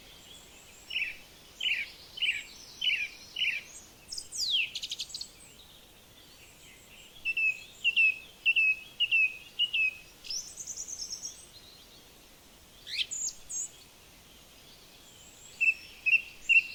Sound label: forest